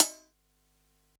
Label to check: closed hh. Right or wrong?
Right